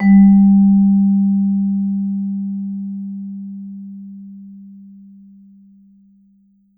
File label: VMED G2, VIBE MED MF; Marimba & Vibes; Sampleheads; Dave Samuels